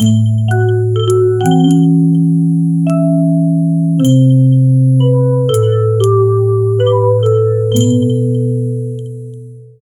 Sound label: soft modern intro, oud